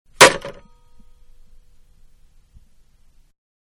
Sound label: Telephone-Slam Down; Electronics; Manhattan Production Music